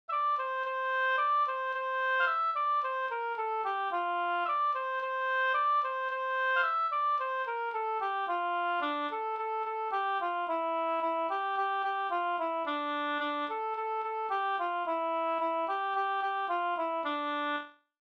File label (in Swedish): folkdans katrilli; Soutjarven fi